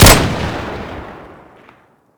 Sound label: new rev; garrysmod